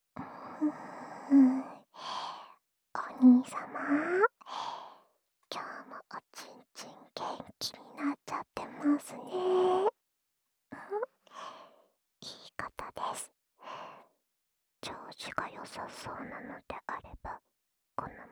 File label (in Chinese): 舔耳／左